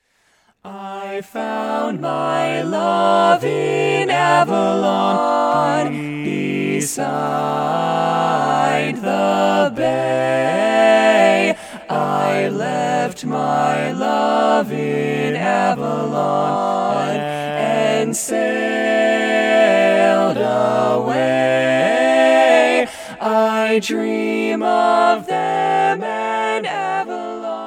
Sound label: Youth Mixed Voices, Barbershop; Learning Tracks; Easy, Barbershop Harmony Society Contest